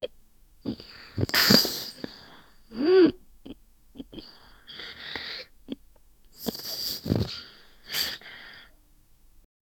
sniffing while crying; tearful

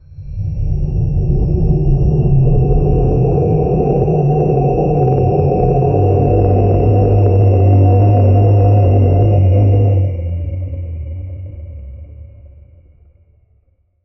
a guttural deep roar; ferocious

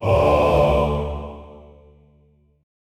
deep gothic mens choir, choir

